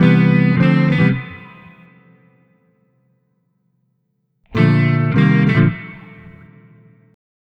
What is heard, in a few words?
Multi Guitar Bossa Gtr-C, 105; Zero-G Kocktail Kollection; Construction Kitz (Lounge)